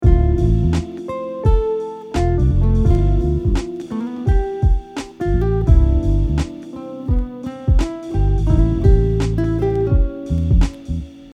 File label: Melody example, melody example 1 using chord tones only, guitar; We'll use the same guide tones from the previous example to build a simple melodic idea